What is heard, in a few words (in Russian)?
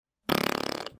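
звуки пук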